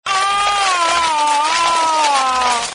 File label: aaaaa; chatsound